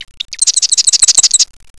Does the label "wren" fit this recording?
Yes